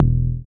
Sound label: dance bass; Sound & Vision - Gigapack II CD 1